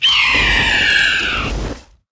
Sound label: Phoenix death